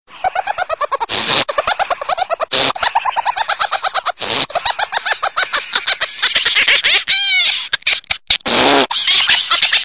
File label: Amusing Laugh